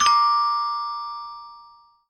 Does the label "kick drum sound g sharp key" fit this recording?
No